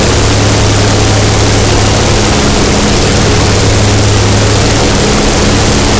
engine bomber rpm close; War Thunder; engines

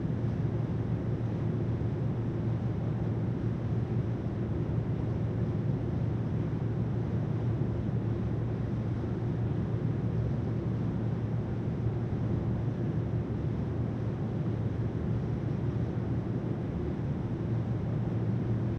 ambient port default; World Of Warships

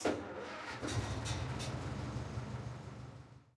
engines; World of Tanks

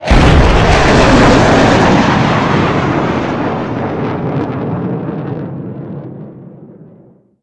vehicle mig29 sonic boom

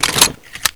Inf Weapons Foley 3rd; Red Orchestra 41-45

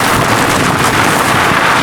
surface tire dirt fast loop, vehicles; Call of Duty Modern Warfare

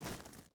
footstep hide gravel walk; Rust

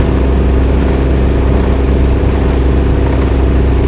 yak cockpit, engines; War Thunder

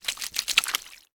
ambient; World of Tanks

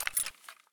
kedr round in chamber; Escape from Tarkov

